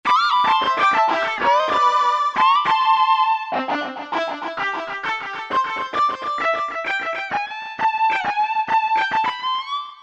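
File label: guitar Electric Pwr, E-mu PK-6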